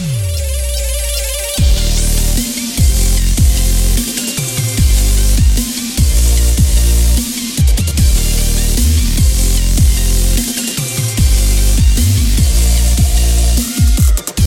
Mid Cut Model, Mid frequencies filtered using modelled filter; Modelling Filters on a DJ Controller